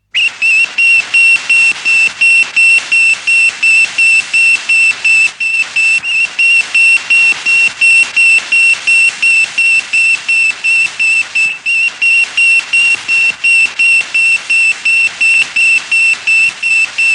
Sputnik 1 Satellite, telemetry effects from satellite; Miscellaneous Electronic; BBC